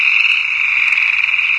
swamp stereo frogs loop; ambient; canals; source engine; hl2; video games

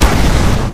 impact airblast; video games; source engine; tf

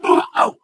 ballbot hot laser

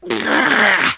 choke, survivor voice

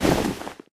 taunt luxury lounge sit; video games; source engine; tf

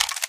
pistol clip in, magnum; weapons; source engine; left4dead2

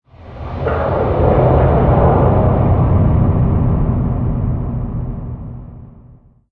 spacebase rumble; ambient; Source Engine; tf

